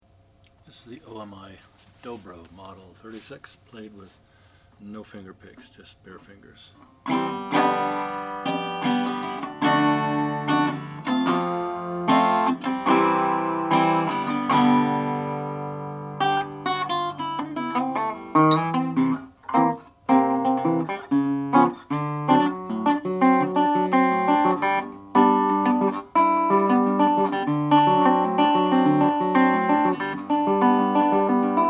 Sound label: omi dobro model 36 chrome